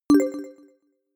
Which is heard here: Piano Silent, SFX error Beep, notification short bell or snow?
notification short bell